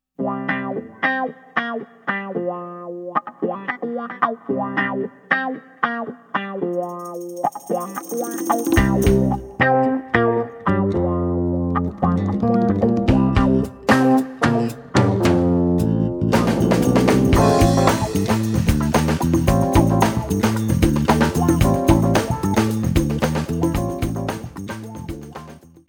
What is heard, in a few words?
FAST FUNK